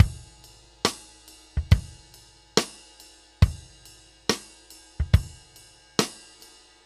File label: Ballad Loop, Drum, 70s Ballad; Laidback; Ballad Loops; Drum Tools